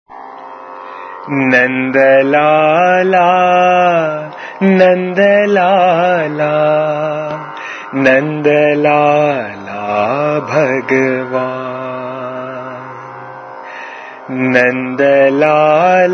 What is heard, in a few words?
Bhajan; Nandalala Bhagawan